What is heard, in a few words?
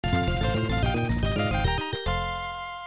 jingle